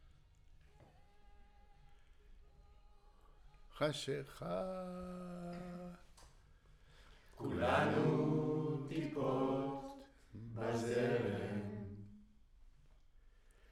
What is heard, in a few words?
Choir